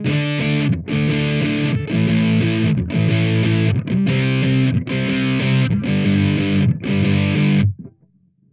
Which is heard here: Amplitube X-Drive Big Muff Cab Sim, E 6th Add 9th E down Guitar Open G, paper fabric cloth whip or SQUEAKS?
Amplitube X-Drive Big Muff Cab Sim